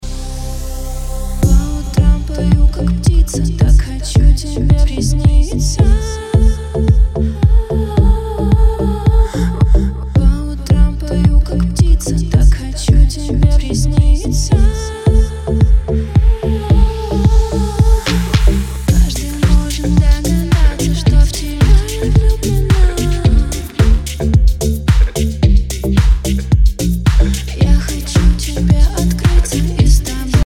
deep house

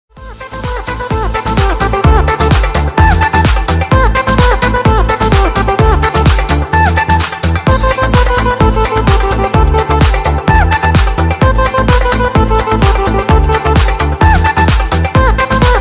nice ringtone

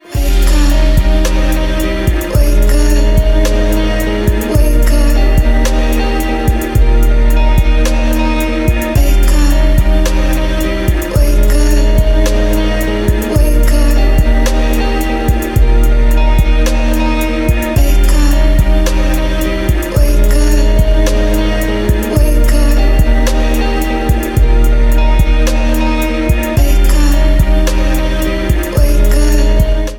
vintage beat wake up

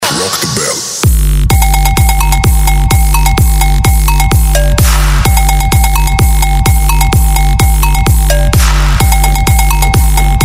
the bell

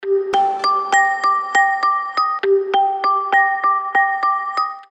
music box sms

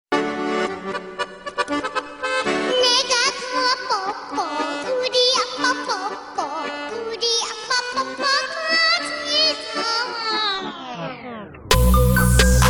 scary

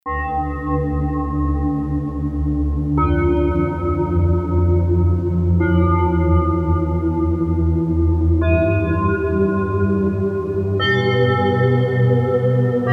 magic bell

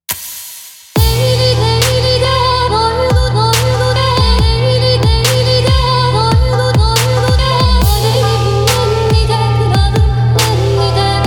turkish trap beat

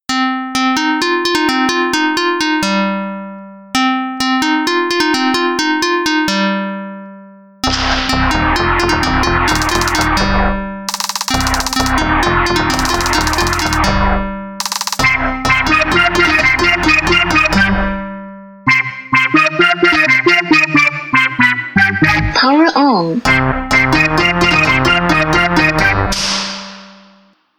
iphone

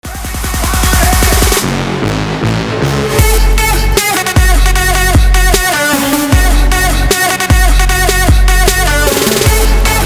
dancehall

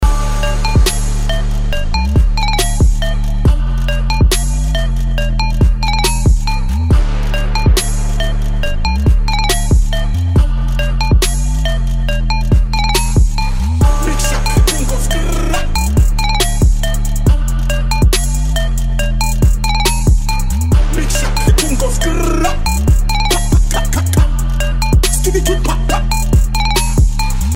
trap remix

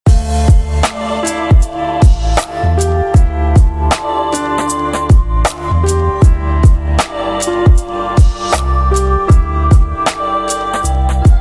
underground hip hop instrumental

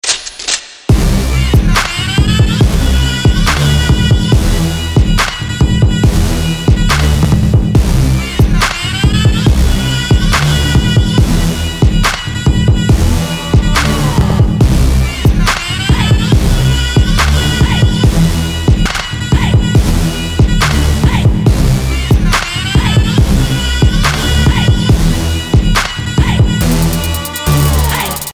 trap remix

